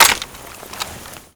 npc catch weapon

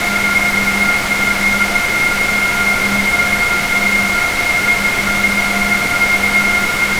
Aircrafts Engines APU In; DCS

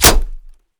impact helmet; Escape from Tarkov

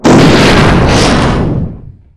crash, damage; DCS

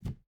footstep rubber boots wood jump start; Rust

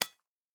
eoka push barrel; Rust

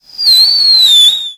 atgm whistle

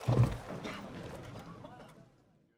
Mi-8MTV2 troops loading end, aircraft; DCS

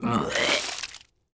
vomit; Rust

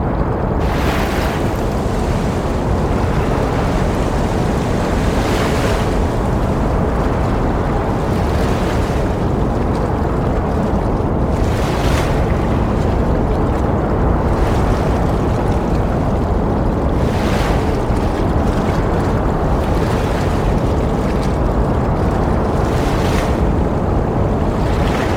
ambience city

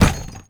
impact ammo box light

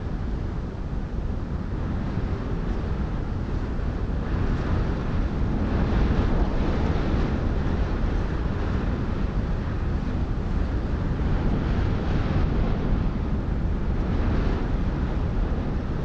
ambient battle; World Of Warships

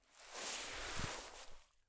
prone grass low; Escape from Tarkov

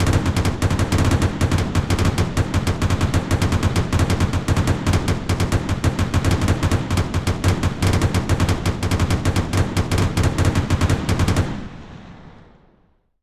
combat atmosphere; World of Tanks

